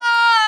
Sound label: female orgasm